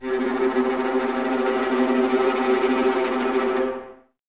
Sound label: Violas Tremolo C 2, String Ensembles; Miroslav Vitous